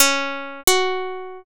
biwa

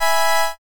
brass

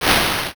swoosh

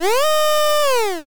elephant

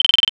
scroll

